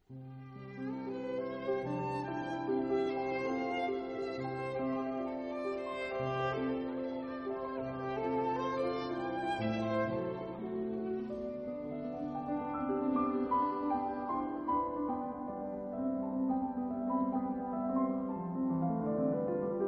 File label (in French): Sonate violon piano